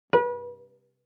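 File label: Cello pizz fortissimo sul A Bb4; MIS Pitches - 2014; Strings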